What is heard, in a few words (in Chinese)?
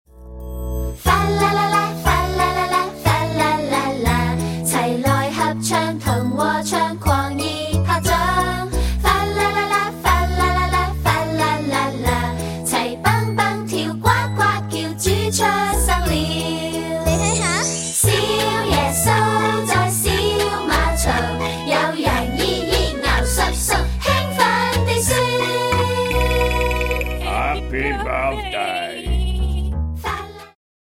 (無對白)